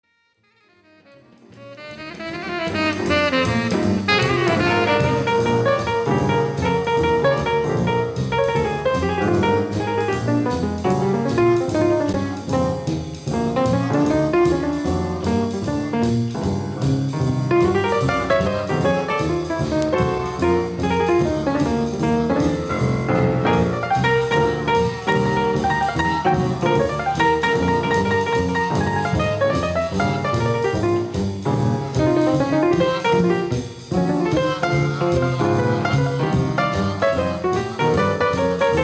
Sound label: buds blues